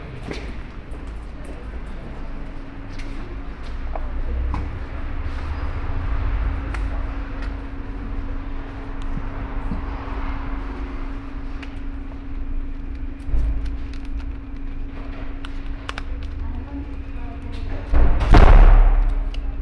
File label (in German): London - Drinnen